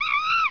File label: Monkey Scream; Triazzle 1.0 Demo